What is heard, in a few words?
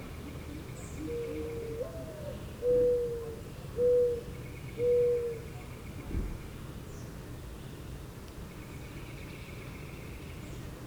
Two mourning doves robin and at one point goldfinch